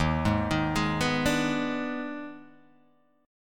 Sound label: Eb Major 7th Suspended 4th Sharp 5th Eb, Guitar, Open G